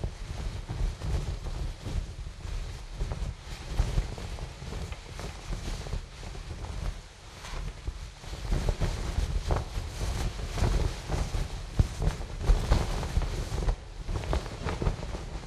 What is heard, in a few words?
tarp mvmt canvas close; Titanfall 2